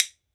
WOOD BONE, Ireland; E-MU Producer Series Vol. 7 – Old World Instruments

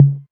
808 Tom Mid; Novation DrumstationV1

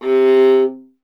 viola C; Miroslav Vitous; Solo Instruments VOL-1

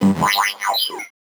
VocoBit Noise, 8-bit; musicradar; 8-bit bonanza

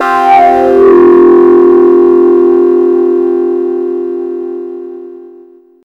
SE-1 Overdrivin'; E-MU Producer Series Vol. 6 – Analogia Project; Strictly Synthe

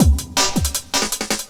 LOOP, 160 BPM, Drum & Bass

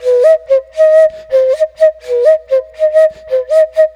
Bamboo Whistle; Ambient; Clean Flutes

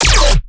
bass hit chonk lazer E; Virtual Riot

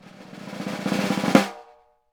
snare, Roland; LA Composer

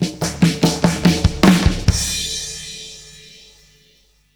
Fills 144; Zero-G; Monster Beats

